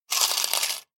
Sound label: collect coins; hideout